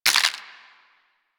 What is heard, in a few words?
Inf Sniper Rifle Mech, Infested; Warframe